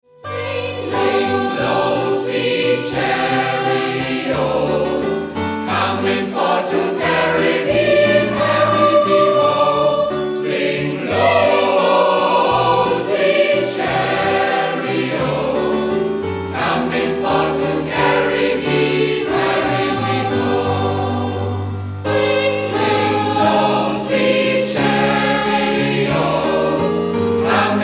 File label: Gospel Live, Swing low; Ultimate Gospel Live